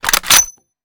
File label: sn rechamber; gmod; iw8; alpha50